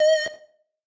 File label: synth